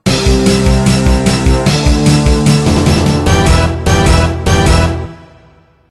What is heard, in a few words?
victory theme; Hyakujuu Sentai Gaoranger